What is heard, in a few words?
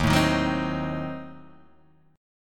Eb Diminished 7th Eb, Guitar Open D